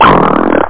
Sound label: Wet Fart; TermSFXv1; Error